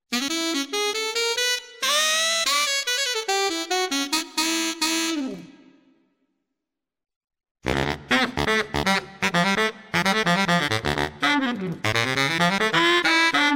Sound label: Genos Sax Brass Pop